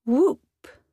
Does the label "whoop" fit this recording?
Yes